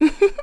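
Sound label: Vox Laugh, korean; voices; heroes; Laudia; kingsraid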